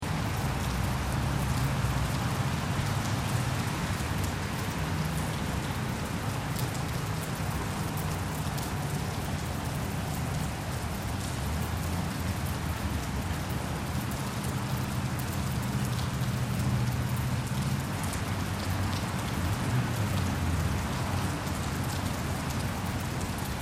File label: Alley Rain Loop, Light rain drips on cement and gutter. Distant city roar.; Rain Sound Effects; LYN105 package of City Ambiences; Lynne Music